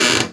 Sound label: Woodcrk